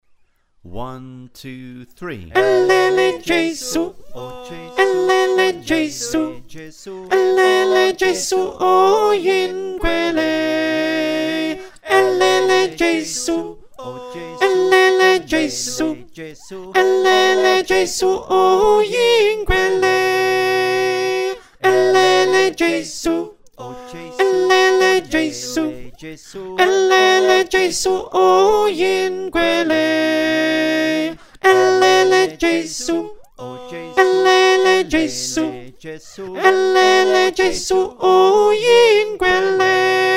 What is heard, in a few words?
Alto, choir